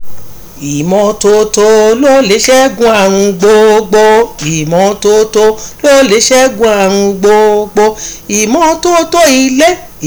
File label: Cleanliness song for primary sch